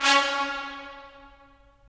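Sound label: brass trumpets staccato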